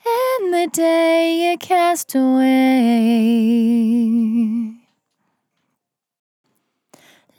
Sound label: On A Star 65bpm F, Vocal; Zero-G